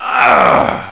death

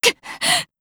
pain; MC Defence

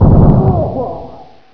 muffled shoot death; wartso; ambient

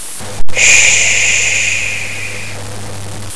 shh

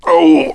death; residuallife; ngrunt

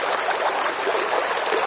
water

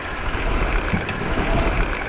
fan

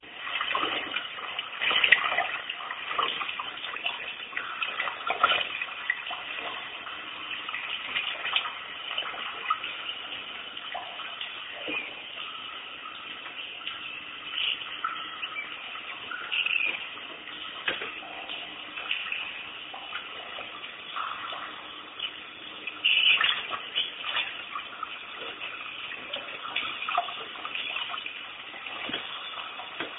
pomp station, ambience

